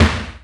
snare mid lofi slam, Classic Chicago House Drum Hits